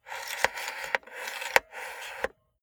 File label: cassette player antenna extend medium